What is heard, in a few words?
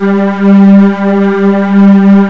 string